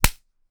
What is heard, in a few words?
Slap; rimworld-animations